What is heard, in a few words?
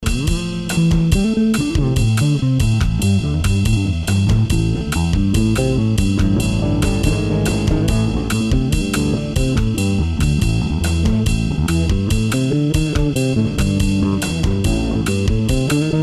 8 string passive mode both pickups fingers, Bass; ShamrayBass